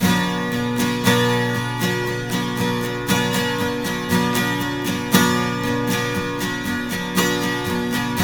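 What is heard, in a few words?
12 string minor C, guitars; Spectrasonics; Hans Zimmer Guitars VOL-1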